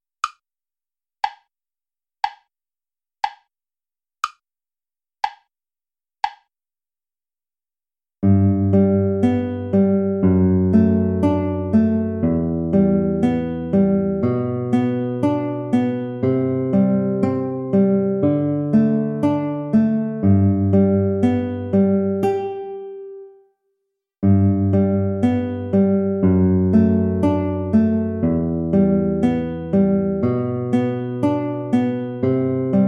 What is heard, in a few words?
down the salley gardens play along